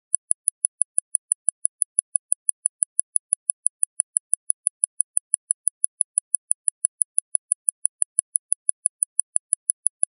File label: LP 10k lpfilter